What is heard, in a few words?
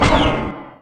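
hall elevator stop; Dystopia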